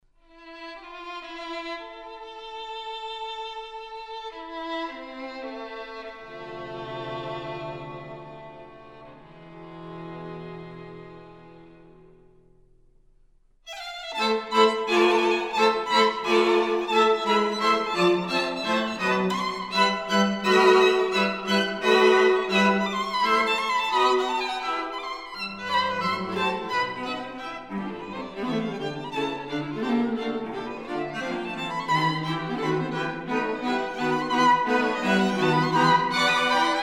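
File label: string quintet; goldmark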